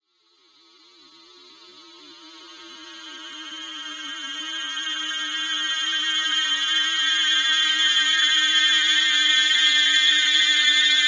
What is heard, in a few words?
Sample Upper Shimmer; SoundChaser; CerebralAudio